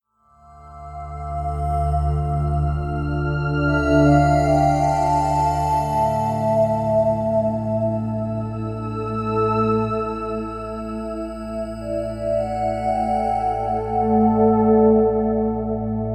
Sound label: Roland JD800